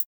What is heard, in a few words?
Korg hihat; Electro; Micro korg